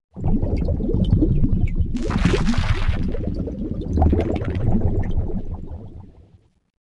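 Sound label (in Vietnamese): Tiếng bong bóng từ đáy biển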